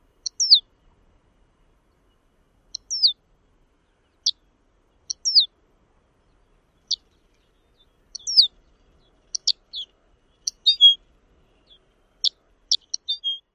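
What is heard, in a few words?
Lapland Longspur, calls; AK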